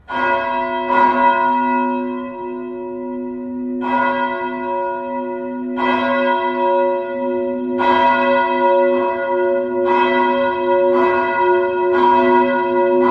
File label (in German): Feuerglocke